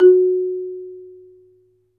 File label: MARIMBA B; Synclavier Percussion; World & Orchestral; ILIO